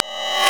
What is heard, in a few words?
LIQUER 120BP; Rarefaction; Poke in The Ear With A Sharp Stick